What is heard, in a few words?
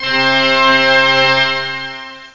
full pipe organ; OctaMED SoundStudio V1; mirage